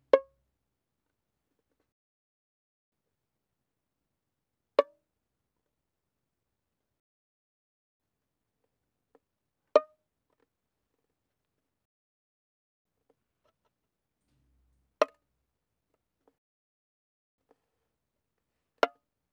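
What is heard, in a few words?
Violin pizzicato fortissimo sul G Db5 F5; Strings; violin2012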